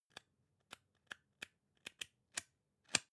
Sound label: magazine browse